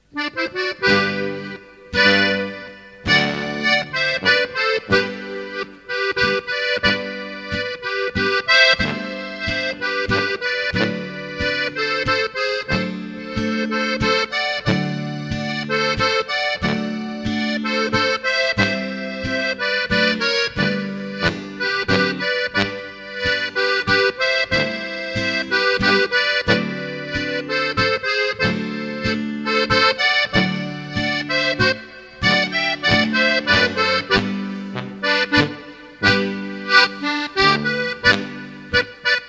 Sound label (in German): Plattler mit Walzer; Mooswinkler